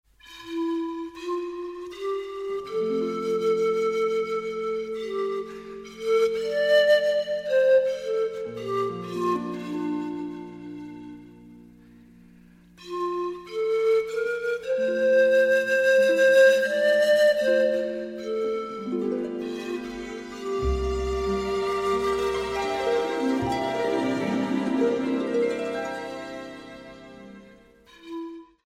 celtic flute ringtone

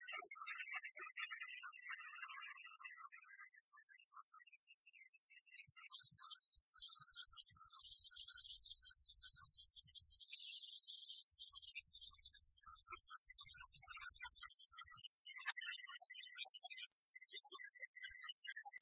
beat up ringtone

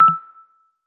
kootenai ringtone, sms ringtones

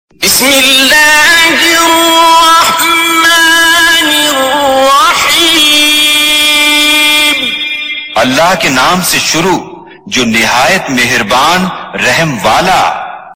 islamic ringtone

